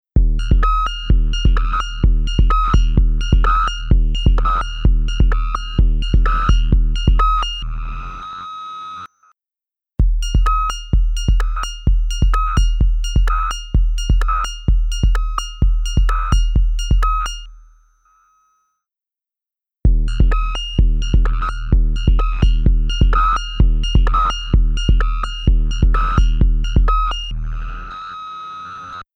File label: Snare Fattener Drum Machine; H9 Bundle; Plug-ins: Rotary Mod (Smoke and Mirrors) + CrushStation (Snare Fattener)